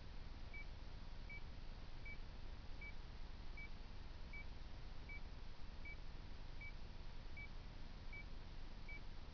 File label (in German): resonanzkreis Abstand ca 1 8m